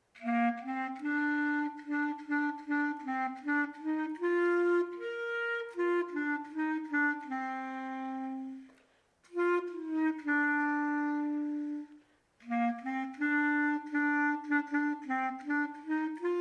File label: instrumental; i will sing of the mercy of the lord